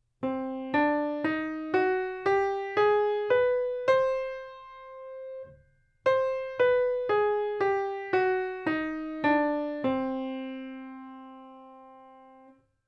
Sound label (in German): Zigeuner-Moll